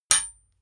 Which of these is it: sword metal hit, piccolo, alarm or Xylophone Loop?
sword metal hit